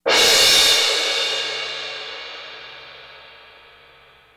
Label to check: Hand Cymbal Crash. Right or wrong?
Right